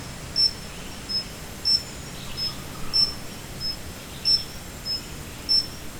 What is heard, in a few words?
Stumpffia analamaina